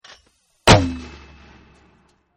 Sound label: Mortar fire, 3 Inch Mortar Fire; Guns; VRSonic